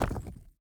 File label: ui drop stone; Rust